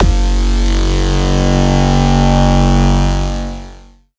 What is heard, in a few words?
bassed, zaps and beeps